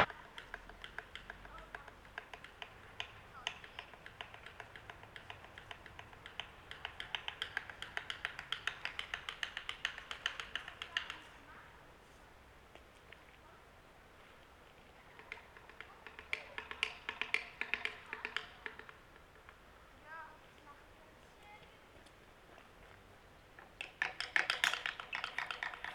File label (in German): hoelzli auf hoelzli; klang im schnee